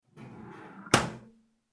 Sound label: toaster oven close